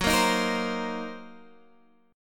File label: C Minor 7th Flat 5th, F sharp, chord